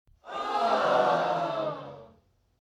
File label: crowd shocked reaction; defeat